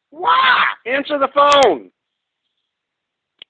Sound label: Screams